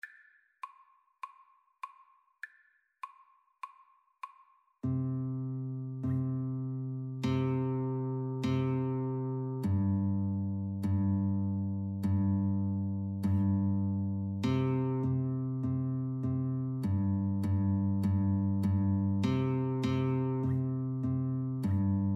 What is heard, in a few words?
Guitar Duet